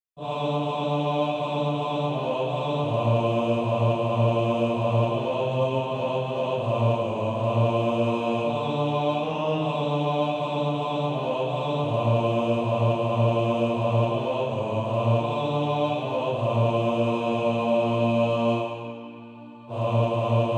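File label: Male Choir, bass 2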